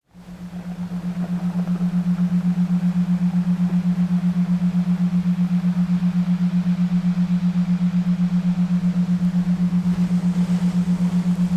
7.83 Schumann Resonance, Isochronic Tones, Relaxing Ocean Sounds